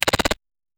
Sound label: dialogue chartyping sfx, typing